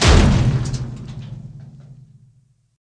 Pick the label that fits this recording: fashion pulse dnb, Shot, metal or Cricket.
metal